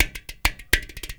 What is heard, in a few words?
INDIA L; Spectrasonics - Heart of Asia CD2